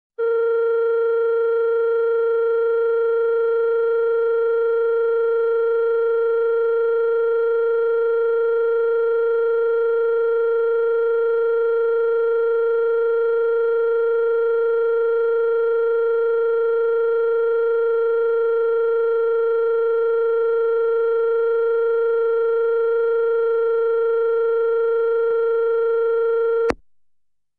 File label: Telephone, European Dial Signal, Foreign; Office; Sound Ideas; Series 2000